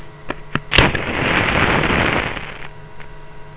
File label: STRIKIN; HOUSE